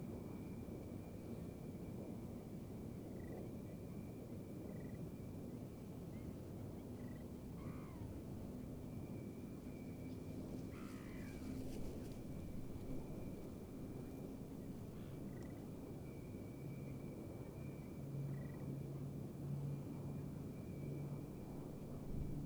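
possible swinhoes rail, singing swinhoes rail in beijing; ma chang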